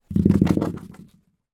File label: animals mouse rat rodent run away scarper hollow surface; zapsplat